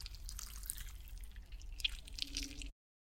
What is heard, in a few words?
stylized squish; soundscrate